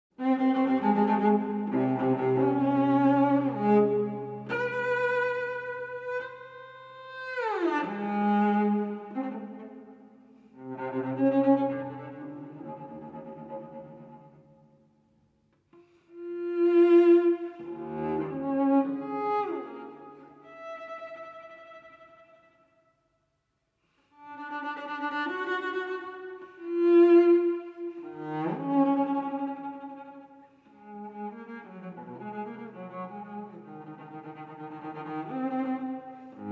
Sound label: Presto, Cello Solo